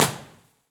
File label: Devils Punchbowl